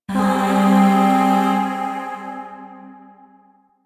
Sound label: Zariman Void Shawzin Min Chord